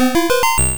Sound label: Teleport; Pokemon; Gen 1; attack moves; RBY